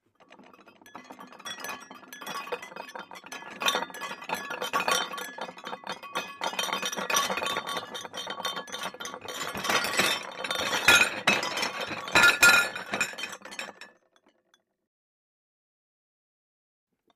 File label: Dishware Glasses Rat, earthquakes